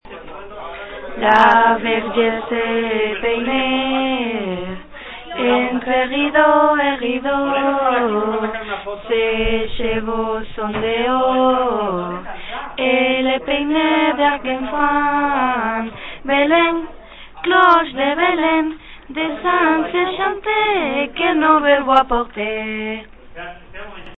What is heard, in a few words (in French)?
Cloches